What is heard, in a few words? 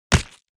BOW hits